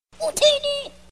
funny theme; star wars

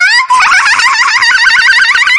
anime evil laugh bgm

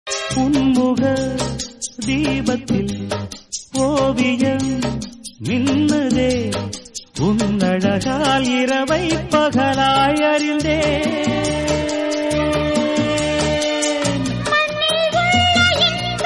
bgm tamil

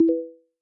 google notification

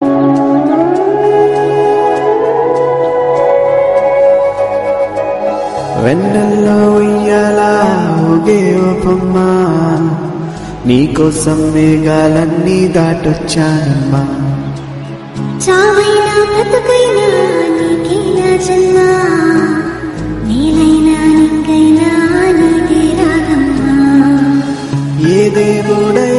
tamil love song; nene raju nene mantri

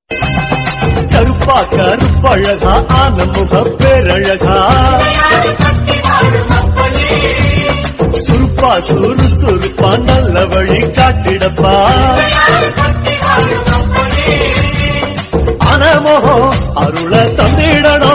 tamil devotional song

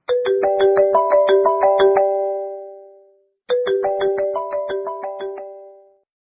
samsung ringtone

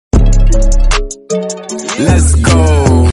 theme music; pubg

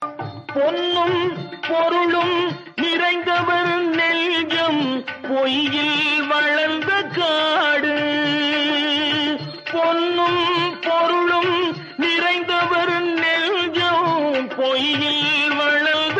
song

